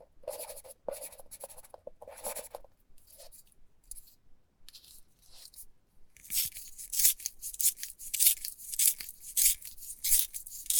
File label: pencil sharpening